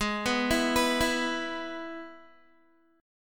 G sharp Minor Sharp 5th, Guitar; G#m#5 arpeggiated

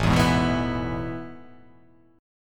A Major 7th Suspended 2nd, chord

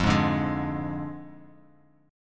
F Minor 6th F down Guitar Open F; Fm6; strummed; JGuitar

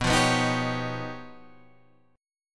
Db 9th Flat 5th B

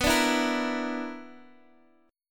B-Minor Major 7th Double Flat 5th, Guitar Open D

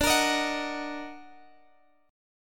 Eb Minor Major 7th D

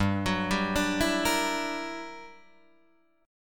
G Minor Major 13th, G, Guitar, DADGAD; GmM13; arpeggiated

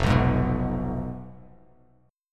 F Diminished F down Bass Standard; Fdim strummed; JGuitar

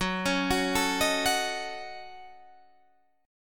A 6th Flat 5th F sharp, chord

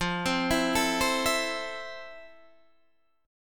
F Major 7th F, Guitar, DADGAD

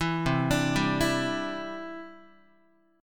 C Major Add 9th, Guitar; arpeggiated

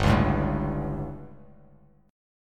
G Suspended 2nd Flat 5th G, chord; Gsus2b5 strummed; JGuitar

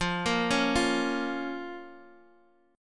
F Suspended 4th Sharp 5th, chord

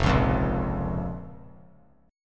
F Suspended 4th Sharp 5th F; strummed; JGuitar

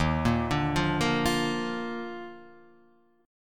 D sharp Major 7th Suspended 2nd; arpeggiated; JGuitar

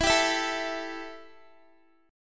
E Minor Double Flat 5th E Guitar; Embb5; strummed; x,19,19,21,21,x

